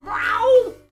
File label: slash meow